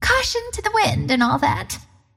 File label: pain; windrunner; deny